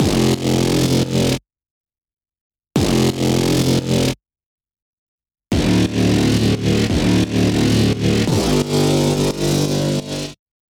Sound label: Bass Synth